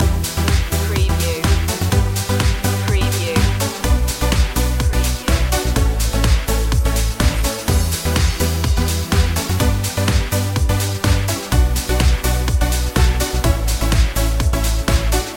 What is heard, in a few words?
fireflies loop